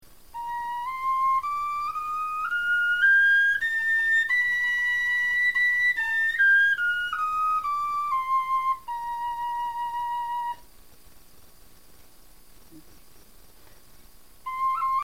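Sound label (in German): tonleiter, Knochenflöten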